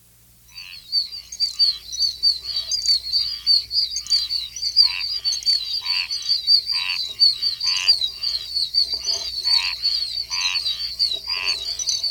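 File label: group chorus spring, frog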